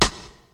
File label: old school crispy hip hop steel snare drum f sharp key